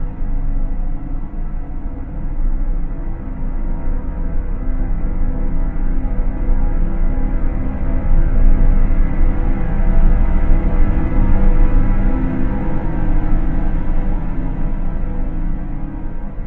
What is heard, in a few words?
Drama swell; Doom 3